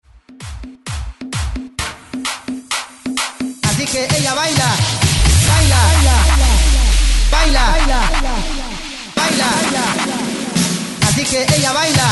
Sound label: 130 BREAK MIX; DJDOLLS; BREAKMIXSMASHER